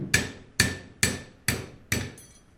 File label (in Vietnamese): tieng mong ngua dong dinh vao mong ngua